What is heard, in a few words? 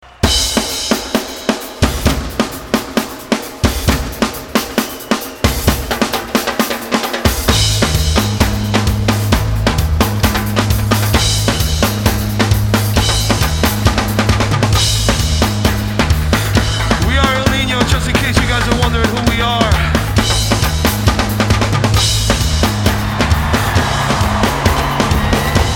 drum solo live